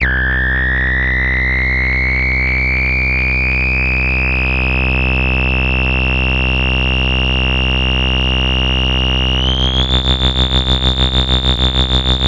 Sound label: electric, special FX twisted; USB Soundscan; AKAI